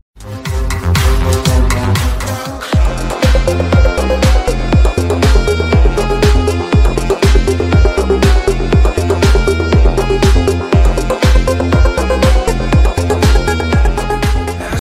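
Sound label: new Russian ringtone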